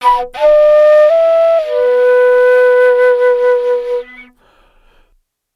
Wood Flute, Asia; Sonic Foundry (Sony Creative Software); Continental Drift Aftershocks

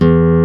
e2-f#3, nylons guitar

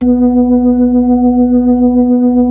B-3

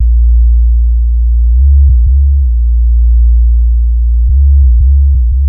D&B Bass Loop; neuro

